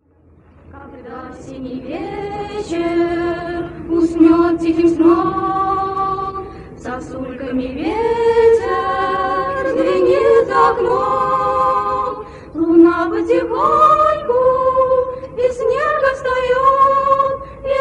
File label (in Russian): shkolnyiy vokalnyiy kvartet